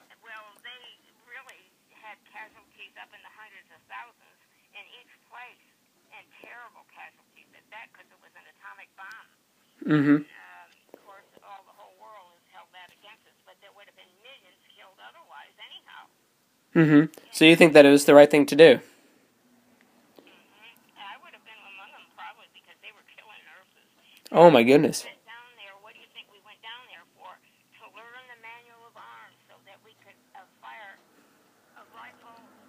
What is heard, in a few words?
atomic bomb